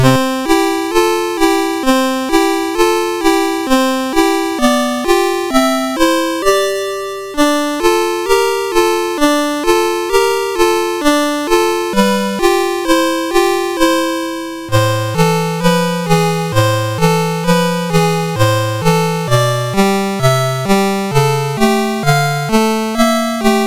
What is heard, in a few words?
sid MOS6581R4, GAMES; Forest of Doom